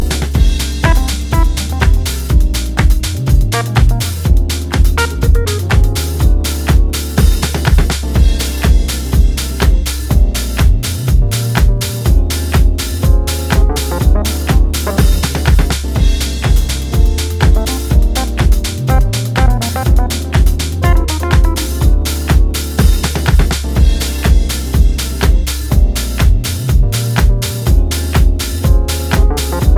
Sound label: Vamp Dub Instrumental